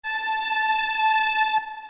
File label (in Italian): string, armonici